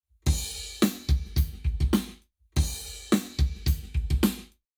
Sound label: Lab Wet, Drums 0 to 360 deg; eMagLS